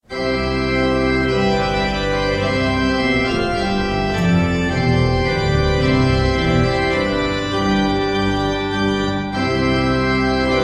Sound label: trumpet voluntary, sackpfeifen